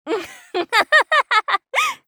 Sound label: laugh; Eternal Return; Hisui; JPN